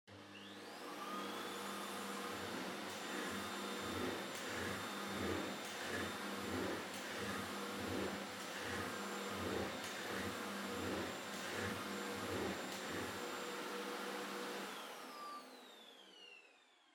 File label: Vacuum Cleaner Far Vacuum Floor Wood Mid